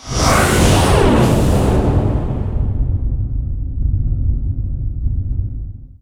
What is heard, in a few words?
global silence effect; weapons; dota; silencer